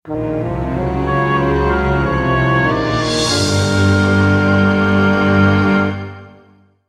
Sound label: Lose Combat; heroes of might magic iii hd 2015 ios